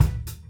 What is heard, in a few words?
HIT; PlatinumPhattCD1; E-MU Producer Series Vol. 8 – Platinum Phatt; Grooves Filz Hitz